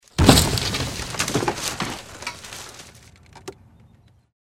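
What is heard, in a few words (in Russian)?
звук падения корзины воздушного шара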